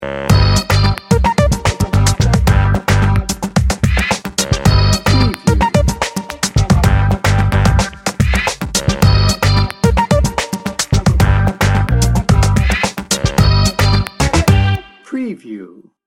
modern fashion

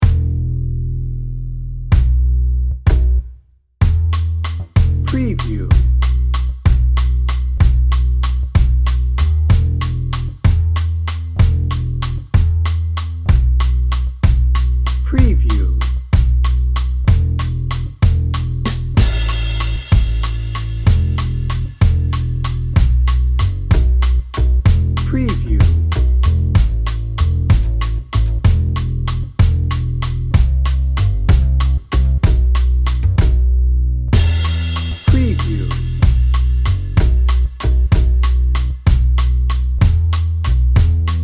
italian romance

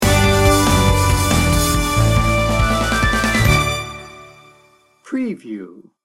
victory sting bumper; Upbeat and driving. Features a Pasodoble feel with Maleguena style brass and string melodi

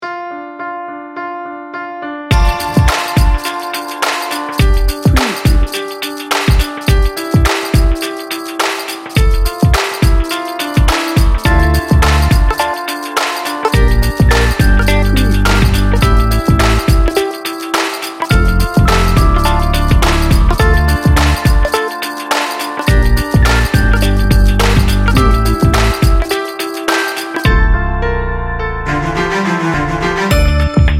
urban chill

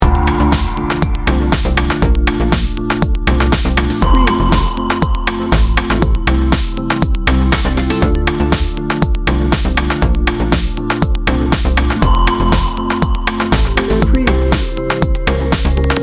dance it out loop

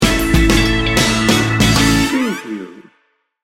memphis soul sting bumper

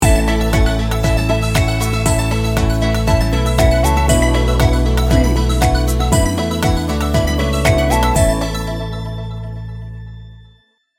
motivating and inspirational bumper

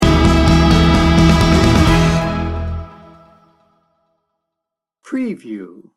bold ambition sting bumper

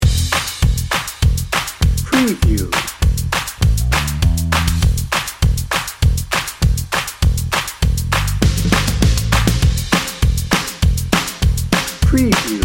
dnb

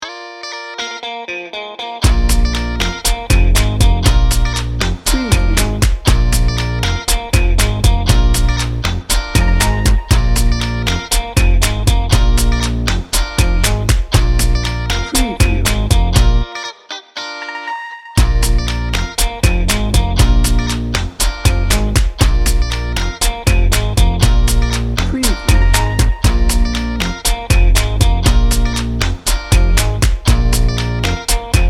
cool kids bed

